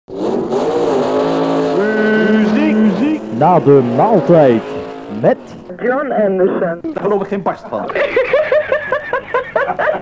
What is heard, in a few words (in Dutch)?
lachen